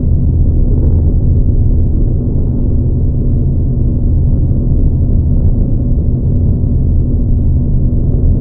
engine

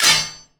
pullout

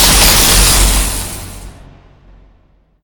impact elect

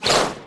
glaive throw

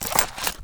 holster out, weapons firearms

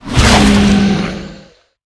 worm striking, giant worm

